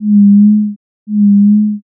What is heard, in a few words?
owl